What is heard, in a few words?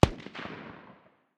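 light machine gun far distance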